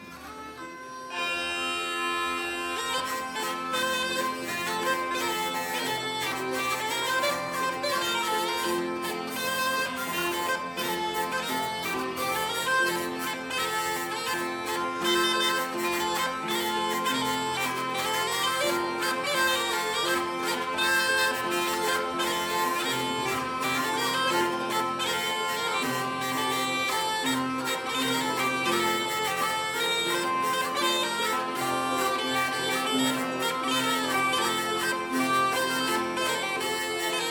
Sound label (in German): Dudelsack-Polka, Harfe, Drehleier